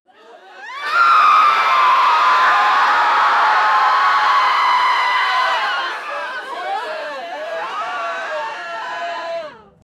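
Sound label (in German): ängstlich schreiende Menschen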